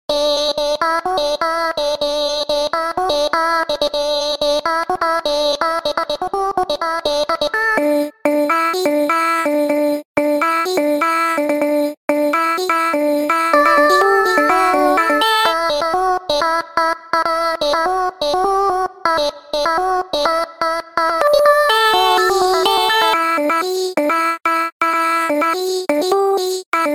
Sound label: High Voices; Funkin